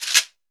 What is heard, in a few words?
CABASA; AKAI S-Series CD-ROM Sound Library VOL-5